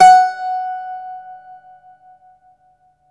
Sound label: Irish Bazouki